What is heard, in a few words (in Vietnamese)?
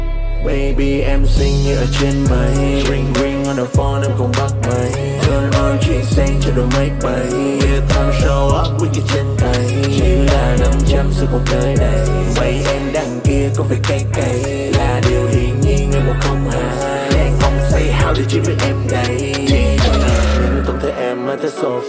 Nhac Chuong, Rap